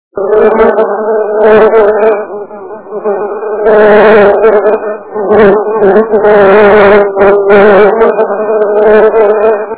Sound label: bees; ilovebees